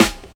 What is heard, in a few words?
Snare OS; Sonic Foundry; Rondo Brothers Trip-Hop Loops & Samples; In The Valley